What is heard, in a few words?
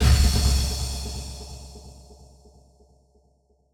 Impact; impact samples